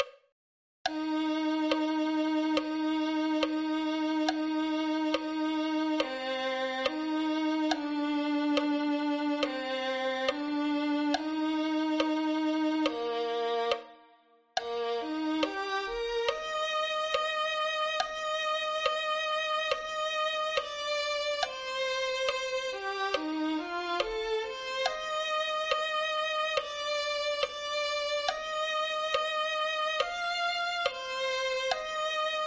violin

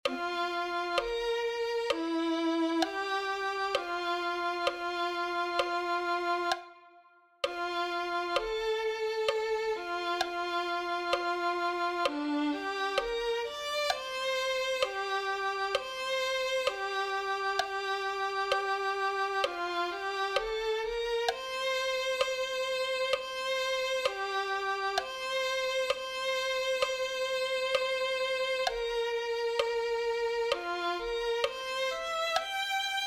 cristo es muy hermoso violin

